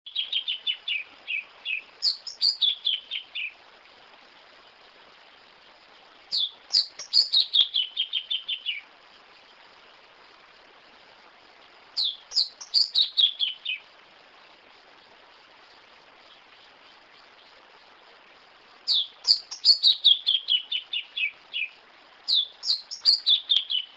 canto do pia cobra

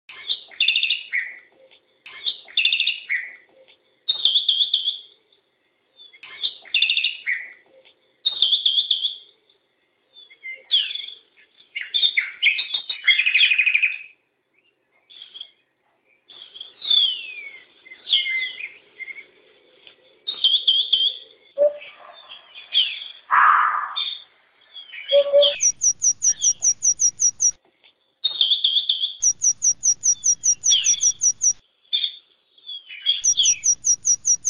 Canto do estorninho preto

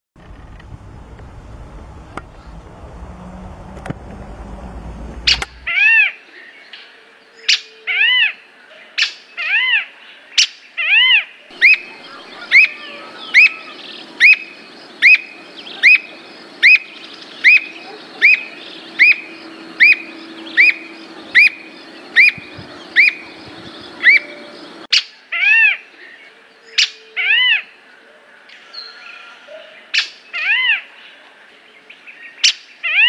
Canto do chincoa de bico vermelho

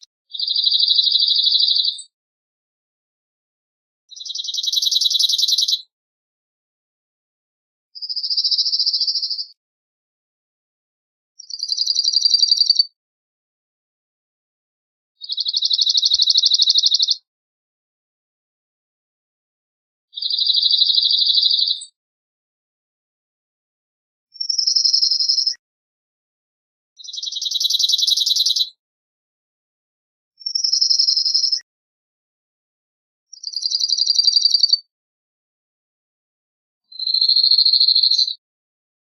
Canto do escrevedeira de garganta preta

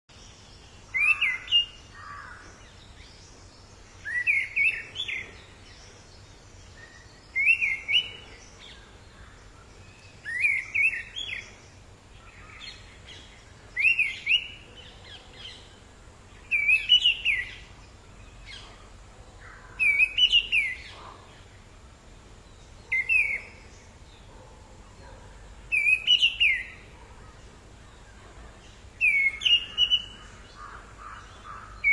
canto do tordo de cabeca laranja

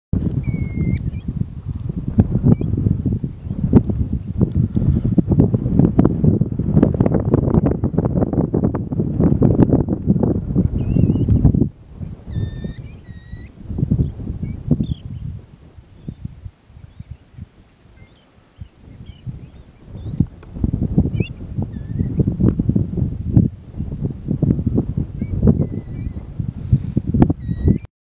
canto do alfaiate

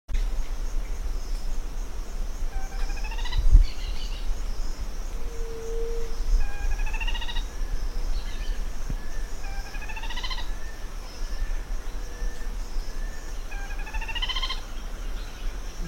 canto do chincoa pequeno

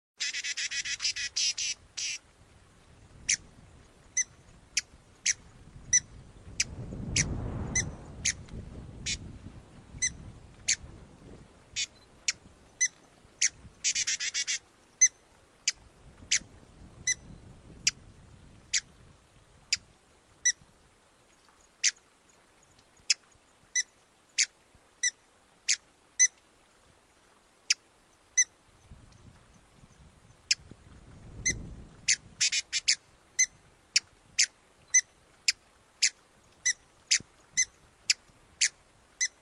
canto da beija flor de gravata

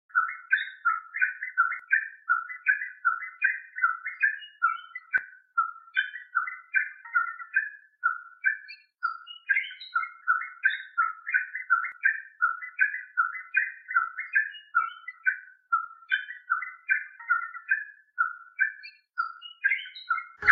Canto da garrincha dos lhanos

